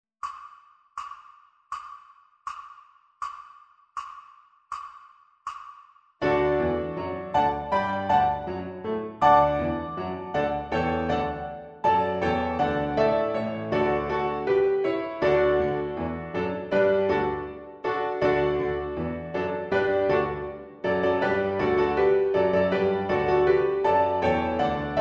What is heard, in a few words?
1st Finger Only Backing Track; Handels Hallelujah; ViolinSchool